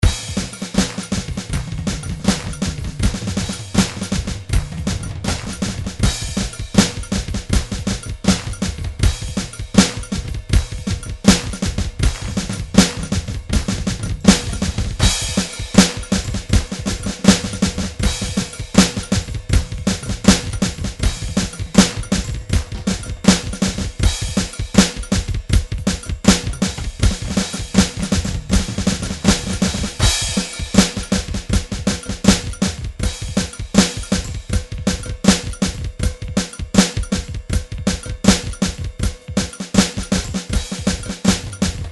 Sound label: drum grooves midi loops rock; Steinberg Nice Beats 1000